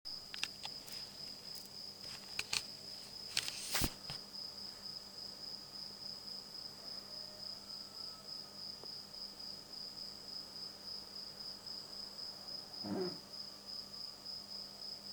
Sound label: anamur dawn garden ambience